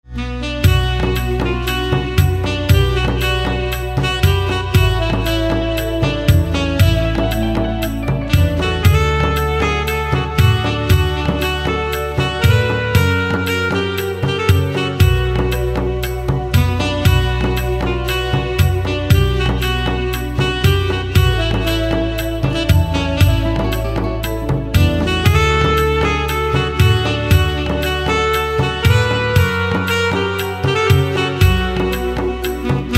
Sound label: Saxophone